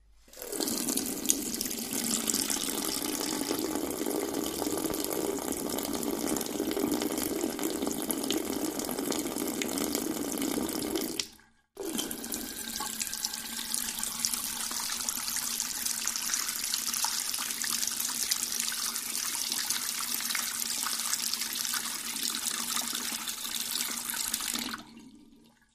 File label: Wash Basin Porcelain; Wash Basin Porcelain x3